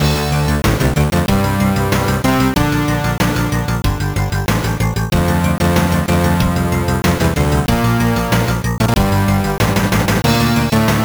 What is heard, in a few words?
Loading Tune; Nitro; Amiga